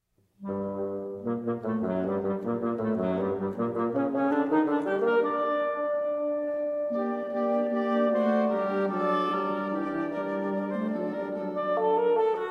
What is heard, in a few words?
Quintet; in G Minor